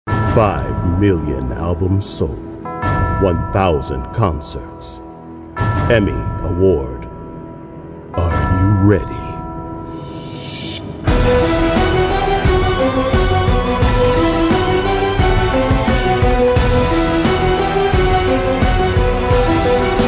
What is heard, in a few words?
Violin